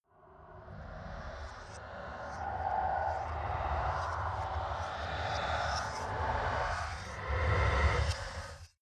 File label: industrial crackle, horror; atmosphaeren umwelt